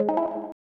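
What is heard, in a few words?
175 drum and bass Synth Ebm; Dance DJ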